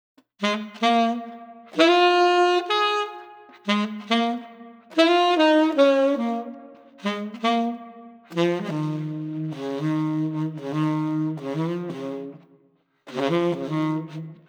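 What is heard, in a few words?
Street Sax Tenor Mellow, 75 bpm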